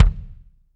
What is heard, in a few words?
BD; Bob Clearmountain Drums I